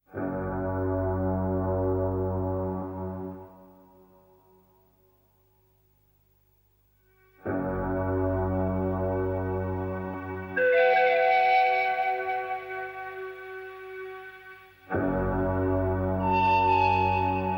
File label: instrumental